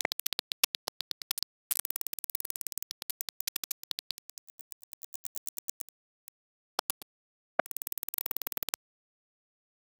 glitch song stutter fx

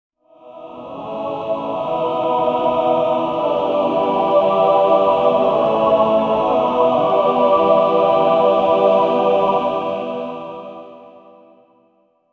the church choir angelic

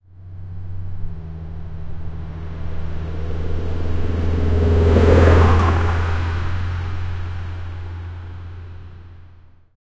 four by four jeep driving

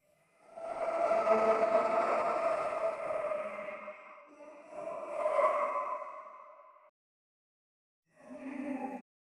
happy caveman grunts

